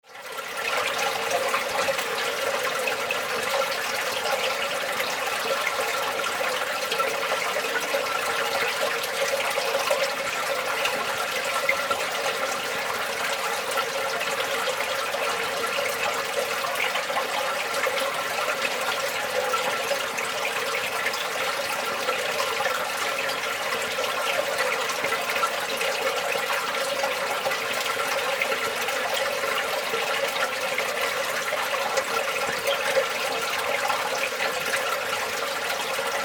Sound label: water filling in